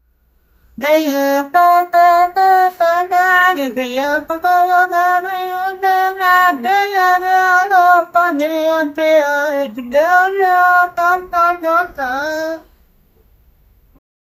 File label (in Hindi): kuch saman girgaya uska s, crash